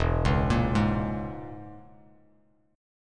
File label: E 6th Flat 5th Bass Standard, E6b5 chord; arpeggiated; JGuitar